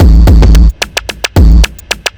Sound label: block rock kit